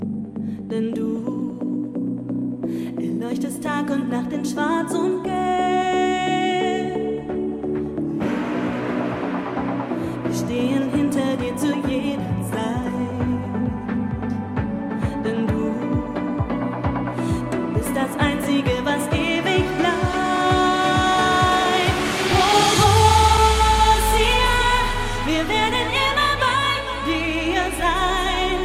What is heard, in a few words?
Fan Song; Bvb